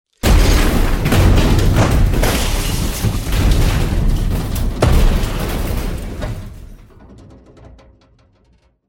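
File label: powerful crash with broken glass and debris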